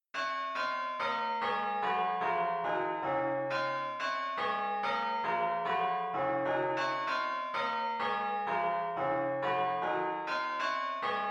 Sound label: Start Of Peal